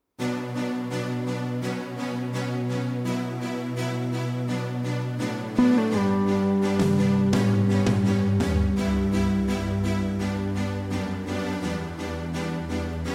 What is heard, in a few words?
Guide Vocal Track Chorus